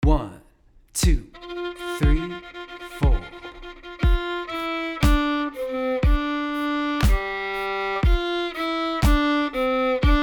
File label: irish fiddle melodic variation; tam lin